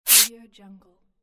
swipe

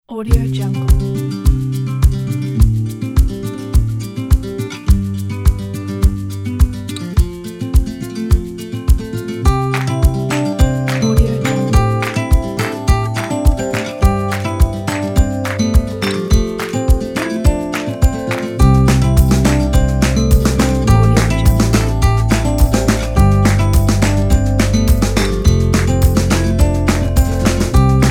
happy aspirational

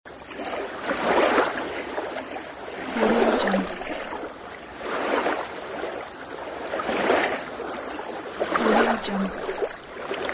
rowing boat in the open sea

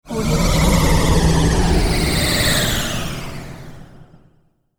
turbo engine descent with flutter; sound-ideas

